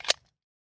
hp18 reload; weapons

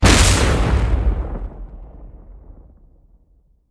metal destroyed; hits

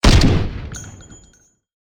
sr25 fire, weapons

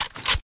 ak47 switch; weapons

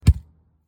cardboard hit; hits

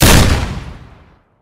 barrett fire; weapons

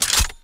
mcx spear switch, weapons

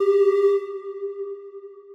warning ping; pings

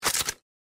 tango 51 switch, weapons

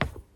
wood step; footsteps

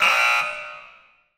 puzzle error

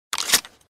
revitalizer reload; weapons

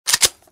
micro uzi switch; weapons

